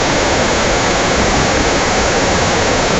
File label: continous magic winter